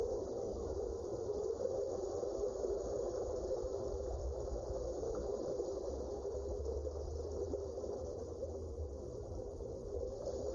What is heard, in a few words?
underwater